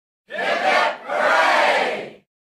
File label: hip hip hooray